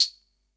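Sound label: SR95 tambourine; Best Service; Dance Mega Drums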